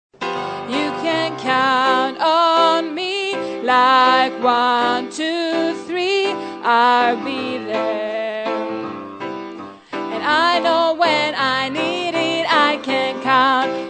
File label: tenor